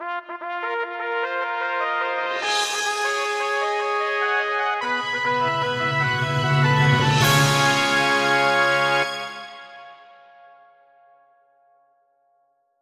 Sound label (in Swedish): fanfar; Kategori: jingel; Genre: orkestralt; Karaktär: dramatisk; Malberts melodier